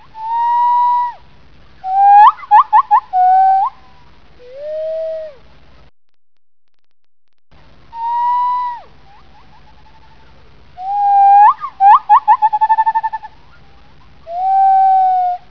klos Male, gibbons

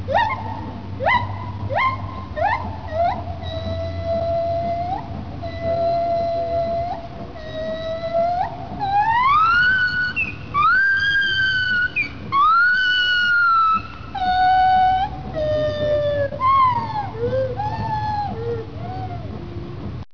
agil Fem, gibbons

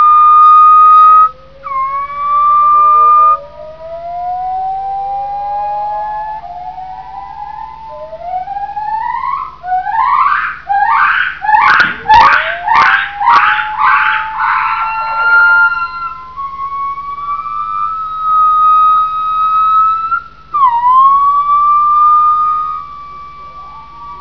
leuc Duett, gibbons

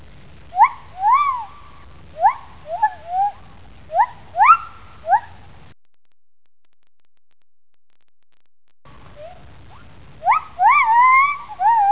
mol Male, gibbons

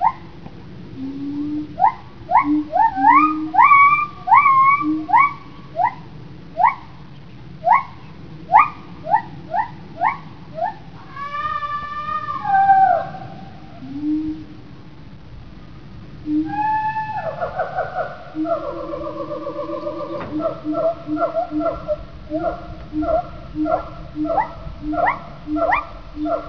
Male, gibbons

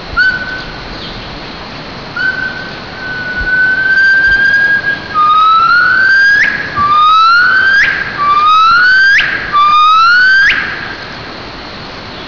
Duet, gibbons

